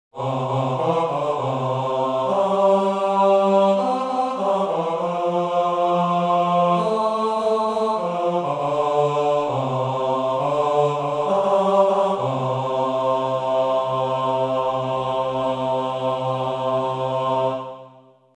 Bass

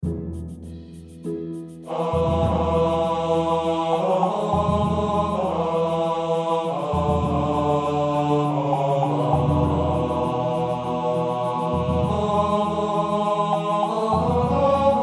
First Noel Tenor

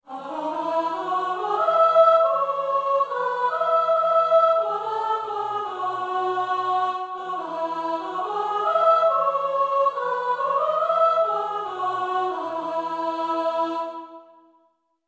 Chorus tune

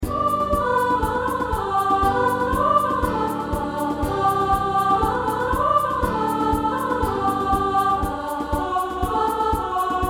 Vocals

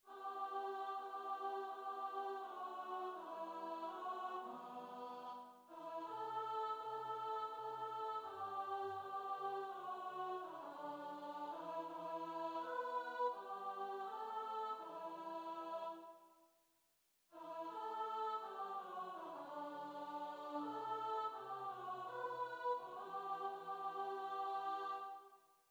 Alto

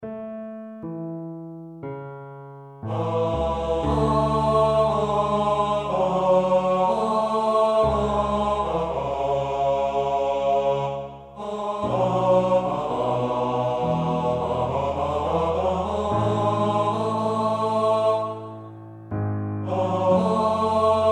Tenor

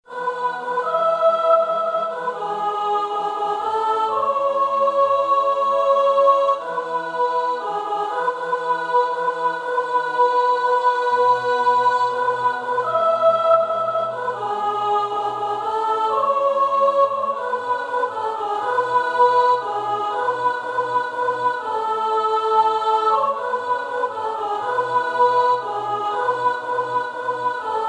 Descant

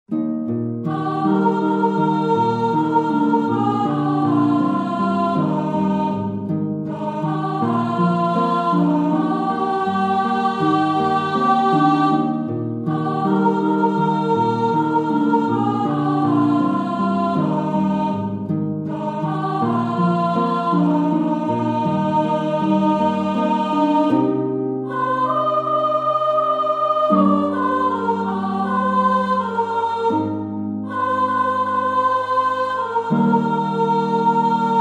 sop top

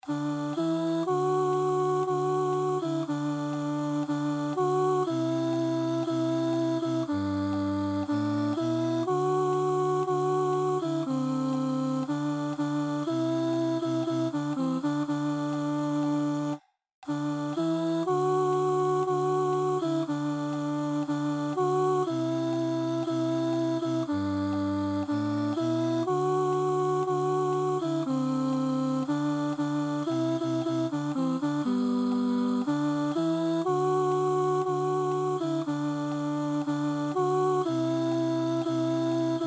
chorus Alto

